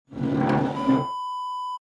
chair scraping floor

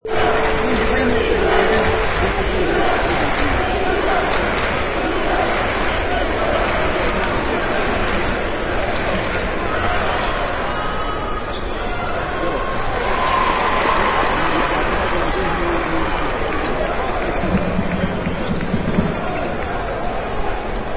Arena Crowd Noise

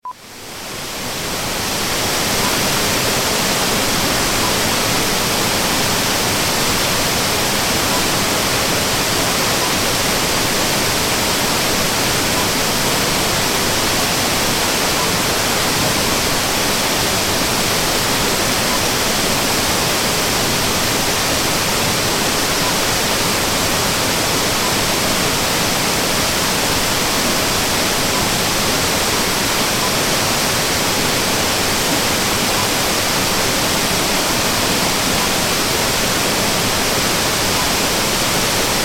Waterfall river

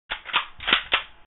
Mauser K98

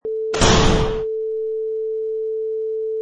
Locker Door Slams

